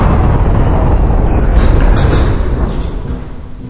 main elevator stop; Source Engine; bshift

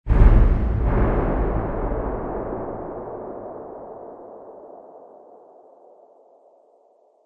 tone metal movement; video games; ambient; Source Engine; tf; lair

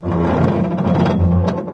metal stress

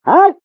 pyro taunt rps exert; tf

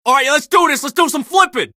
taunt flip exert; scout

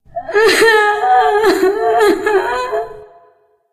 walking cry, witch voice; npc; source engine; left 4 dead 2

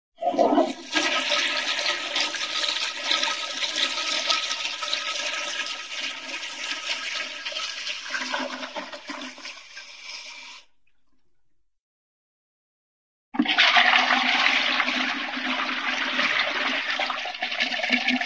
toilet tank toilet flush; cartoon

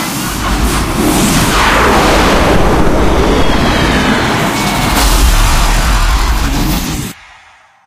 missile launch; video games; doomsday; source engine; tf

